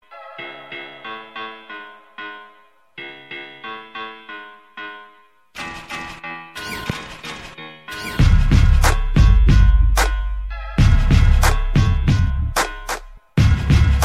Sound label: New Tone